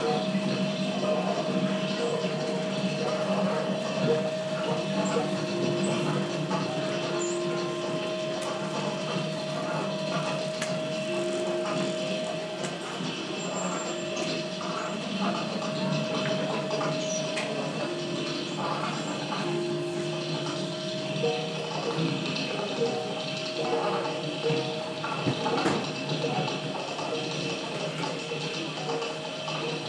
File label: electro acoustic musical machinery powered by the river tyne